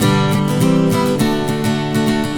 Pop Action, Acoustic Guitars; ACID MUSIC

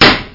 Baloon

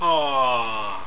awwww

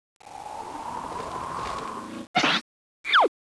Laser Lab Sound

